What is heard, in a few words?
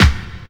COMBI, Houseworx; Ueberschall; Workz-9